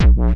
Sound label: Wave base single